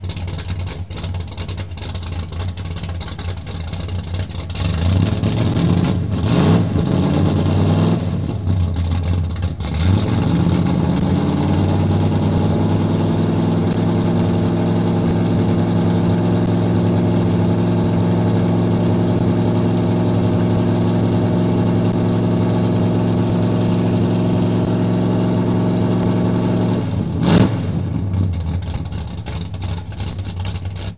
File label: Mustang GT350 Fast Idle, Automobiles